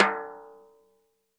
natural sd rim off, snare; single hits

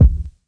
kick

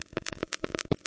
descending clickers; LOOPING AMBIENCE

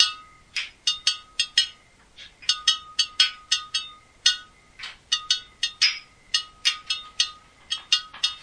beam bottle clinkin, 114 bpm

